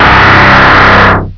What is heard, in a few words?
ELECTRO